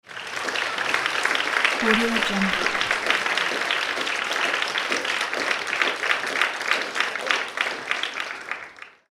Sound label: small crowd short applause